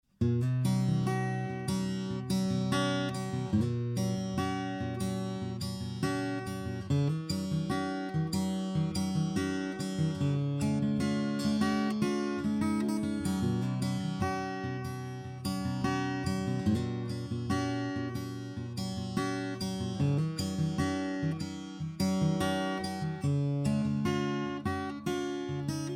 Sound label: 114ce fingerstyle; LTD